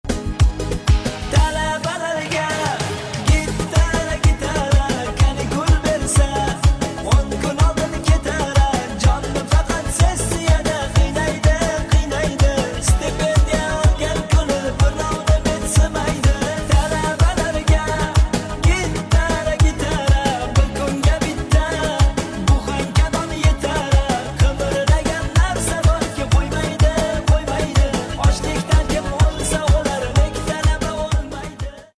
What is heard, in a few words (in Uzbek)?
gitara; husan tog'o; talabalarga